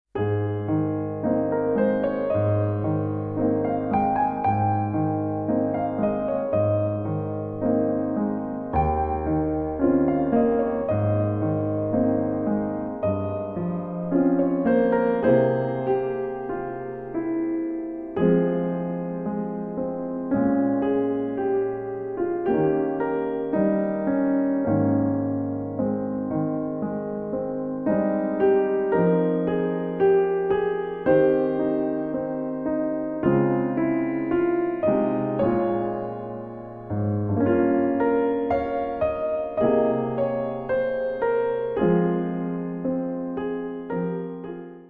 Relaxing Piano Music

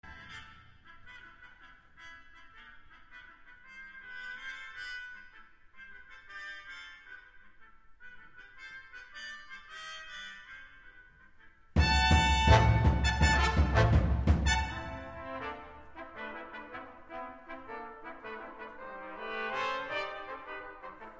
Prelude For the Brass

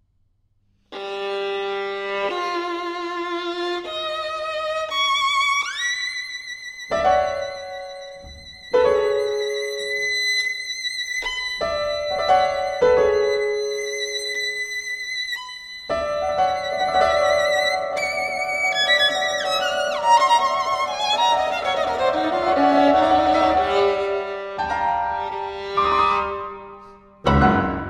violin and piano; Suite For Violin and Piano, Op. 6 Introduction. Andante Maestoso

